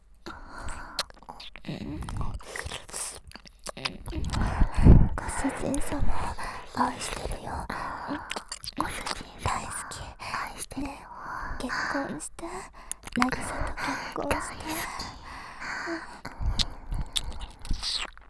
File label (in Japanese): 耳舐め 喘ぎ